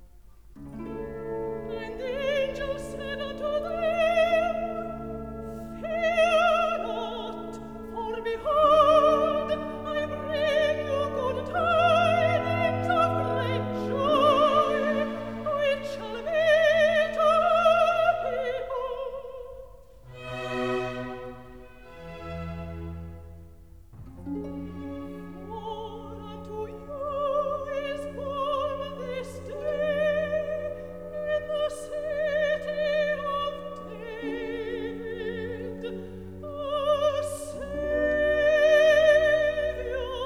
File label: Recit (Soprano); And the angel said unto them; Messiah